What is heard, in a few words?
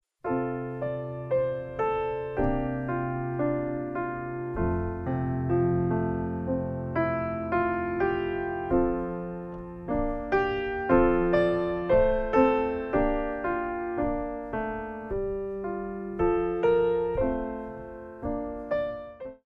slow tendu